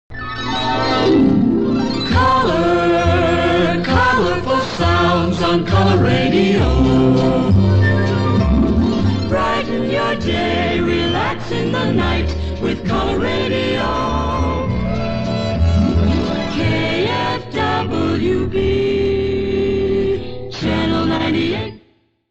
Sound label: Jingle; KFWB